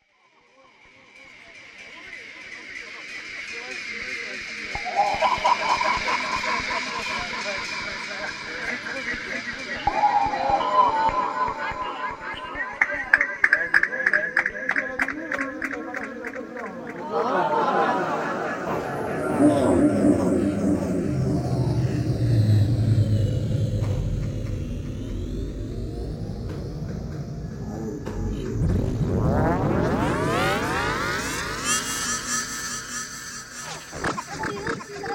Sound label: some noise